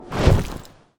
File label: dung defender self ball launch; Hollow Knight